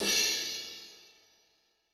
Ride, Cymbal; Yamaha DTXpress-II

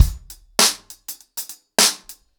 100BPM, ACOUSTIC DRUMS

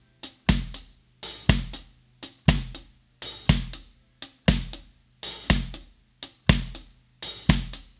Drums, 70s Analog Funk

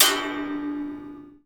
Springs, cartoon Foley Fx; musicradar; cartoon caper

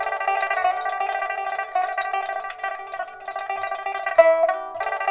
PIPA, 90; INIS - Opium

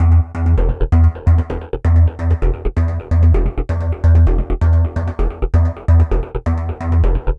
Bass Arp, Basses; Dr. Fink's Funk Factory

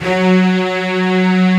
STR 2 SEC F#2, HALL STRINGS; AKAI S-Series CD-ROM Sound Library VOL-7

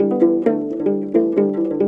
HRP AF HRP, Afro Harp Phr

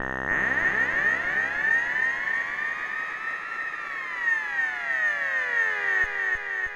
synth FX, Cmin; Big Fish Audio; Hardcore Breakbeat Action; Dangerous Place

